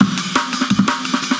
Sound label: simian amen, Amen Breaks